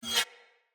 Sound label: Dagger Whoosh Accent; Weapons; Player; Swords; Fey